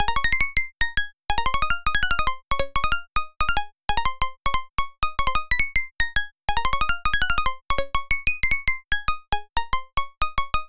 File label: Pluck